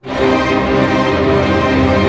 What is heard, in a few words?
ARP C, STR ARP MIN; Sound On Sound - Peter Siedleczek's Orchestra